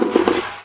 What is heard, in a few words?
redline; DanisRace